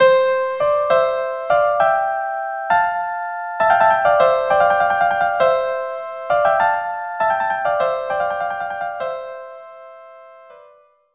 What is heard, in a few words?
Violin Duo; pifa